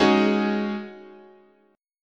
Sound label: G sharp 7th Suspended 4th, chord